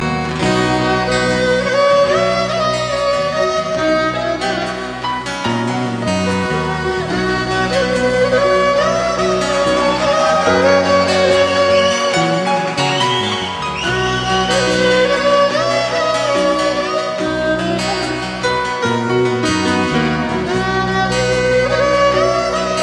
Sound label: ringtone